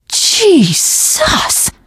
violence awe, teen girl, survivor voice; Left 4 Dead; Source Engine

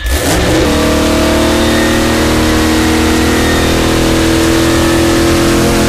junker turbo on loop, vehicles; video games; source engine; ep2

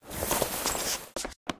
taunt didgeridoo stand up; video games; source engine; tf

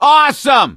positive noise, gambler, survivor voice

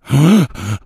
become alert, infected

